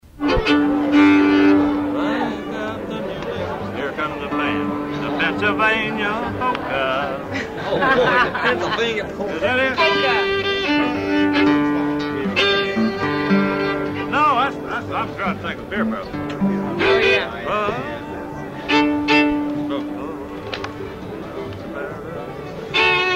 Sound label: fiddle